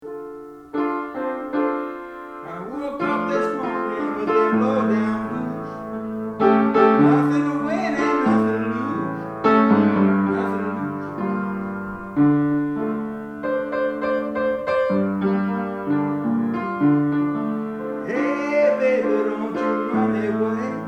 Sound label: lowdown blues